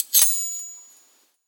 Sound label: ping